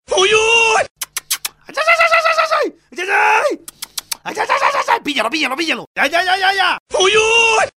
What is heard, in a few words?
Very Funny Tone